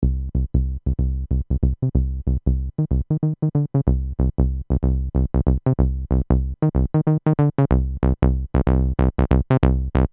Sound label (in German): Roland TB-03 Bass Line Saegezahn